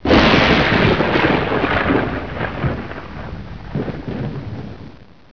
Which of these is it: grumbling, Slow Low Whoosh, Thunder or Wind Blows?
Thunder